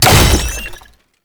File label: Scan Impact; Frumentarius